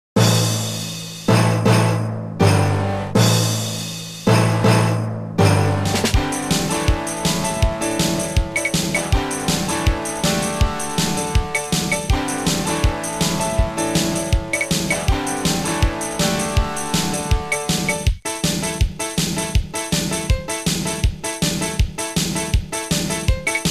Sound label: msx pcm